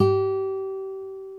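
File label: G3 classical guitar; InVision Interactive; Lightware VOL-1; Instruments & Percussions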